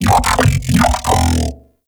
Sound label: Mech Ambient Vocal; Nechro Mech; Vehicles